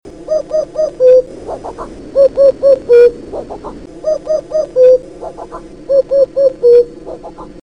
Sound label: Cuculus canorus; Cuculus canorus balbulus absonus